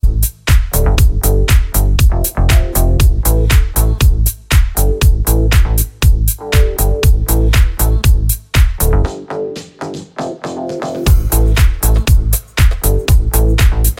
dubstep ringtone; dubstep djs

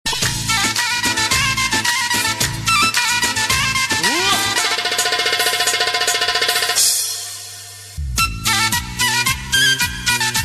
ringtone

